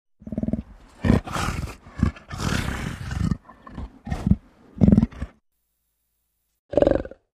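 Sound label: leopard voice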